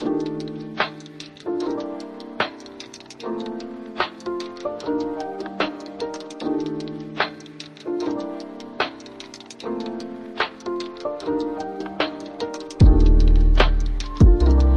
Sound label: lofi hip hop